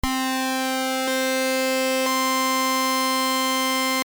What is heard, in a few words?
SAW SAW OCT UP